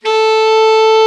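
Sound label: alto, sax; Best Service Gigapack 1; BR SAX 1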